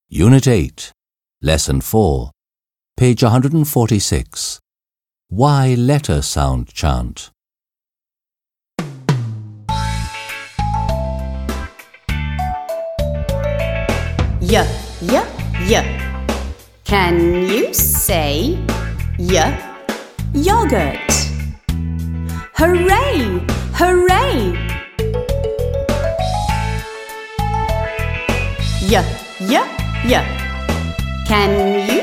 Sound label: Y Letter Sound Chant